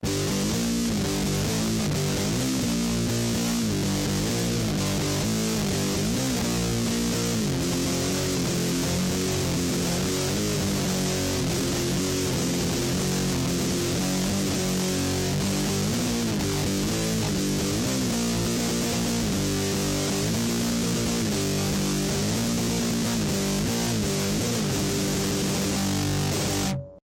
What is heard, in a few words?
Another Early Riff